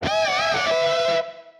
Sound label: Guitar Cheer; Ash Adams Gobsmacked